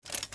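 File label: Armour Movement; Civ4 warlords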